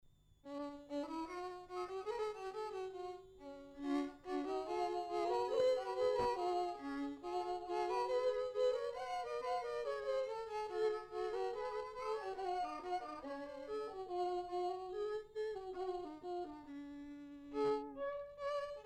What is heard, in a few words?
Violin Duets